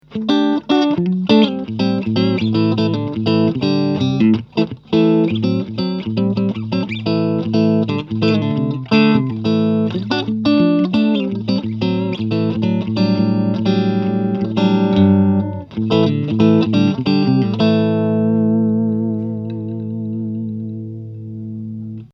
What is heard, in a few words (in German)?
clean hals